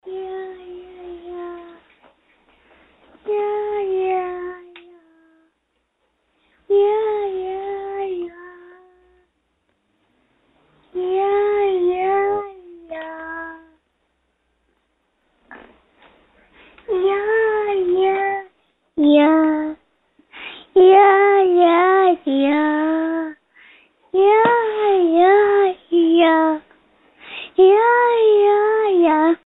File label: first singing; первое пение